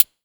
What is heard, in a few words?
Hi Hat